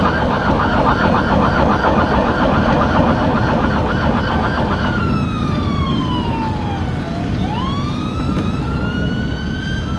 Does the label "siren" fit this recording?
Yes